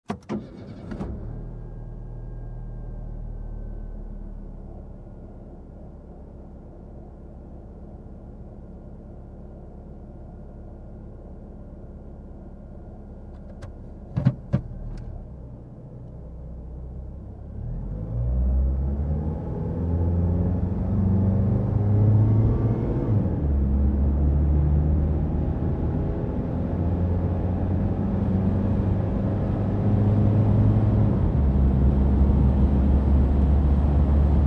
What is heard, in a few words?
Car engine start and driving